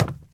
wood step; Golden Crayon Sounds

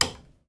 light switch; Golden Crayon Sounds

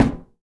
wall tin; Golden Crayon Sounds

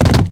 wood fall; Golden Crayon Sounds

